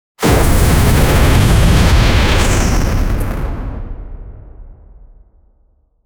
bioenergical heavy plasma

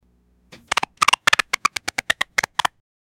old cartoon fight sound; fighting

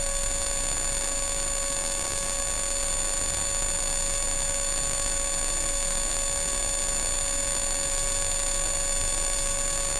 electricity

